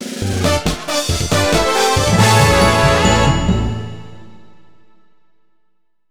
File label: 1-Up; sonic galactic